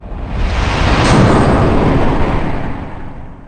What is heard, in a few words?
long woosh